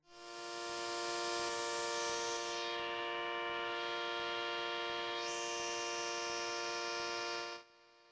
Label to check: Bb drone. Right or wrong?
Right